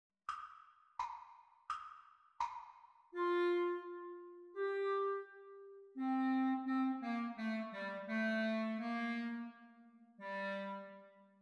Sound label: Exam Speed Recording Clarinet Duets; Grade 2; The Teacher part